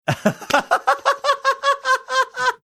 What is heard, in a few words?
cute laugh